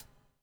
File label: BUBL, Backbeat; Spectrasonics - Backbeat